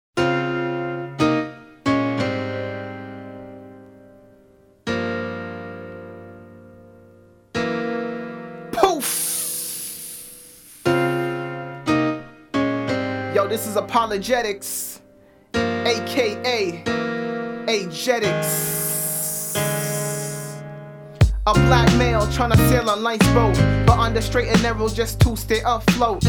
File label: Music Intro